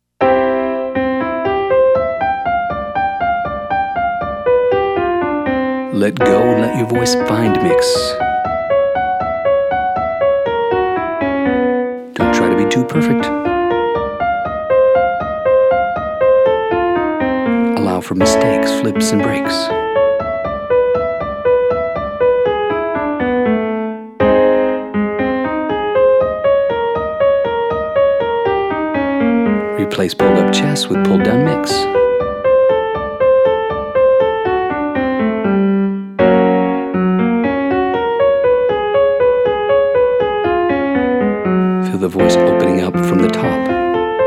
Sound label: Your Turn female